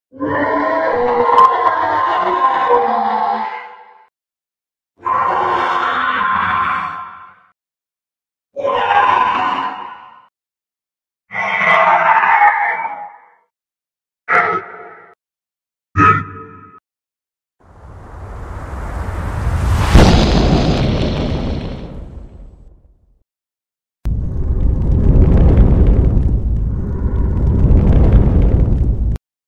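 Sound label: fiery poltergeist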